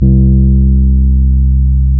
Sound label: Rhodes bass C3; Keyboards of The 60's and 70's